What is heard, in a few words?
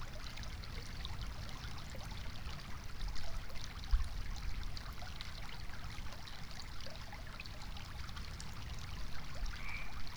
frog